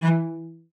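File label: CELLO spiccato E3; Xsample VOL-5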